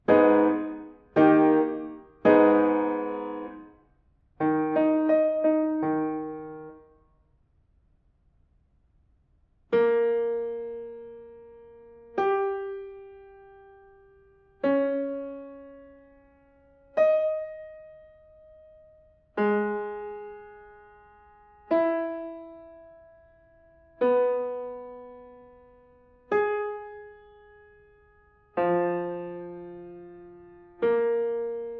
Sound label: diatonic collections